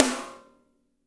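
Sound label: Piccolo Snare; BG SNARES 4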